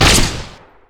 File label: basic attack; LoL; caitlyn